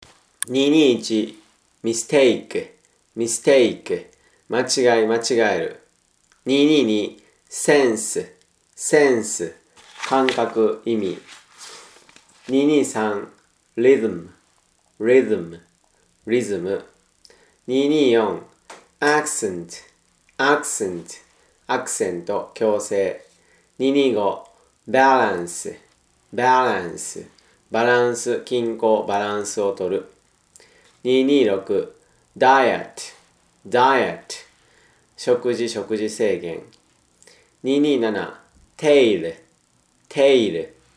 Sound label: tango